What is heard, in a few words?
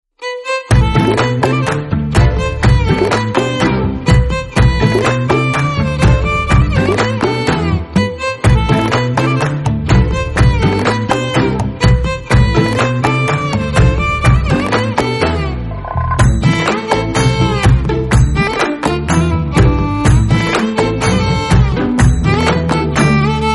telugu love; maikamma thiru movie